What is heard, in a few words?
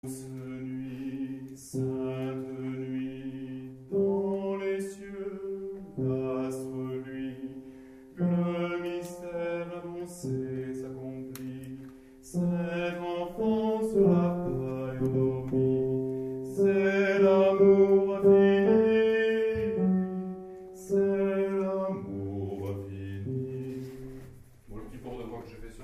basses; DOUCE NUIT